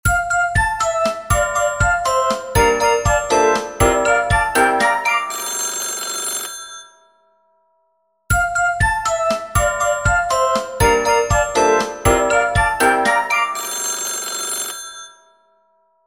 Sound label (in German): Klingelton; patricia von falkenstein